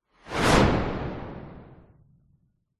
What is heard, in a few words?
sound design whoosh fast whizz powerful; zapsplat